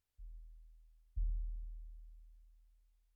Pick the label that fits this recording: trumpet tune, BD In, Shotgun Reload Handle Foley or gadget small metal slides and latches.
BD In